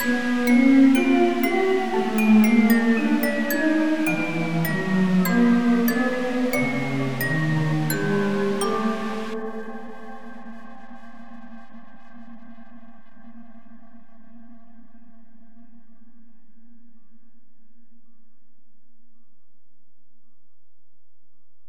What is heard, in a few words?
Retry Jingle; Friday Night Funkin' Dave's Late Halloween OST mod Windows gamerip 2022